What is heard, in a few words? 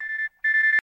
New Mail Jingle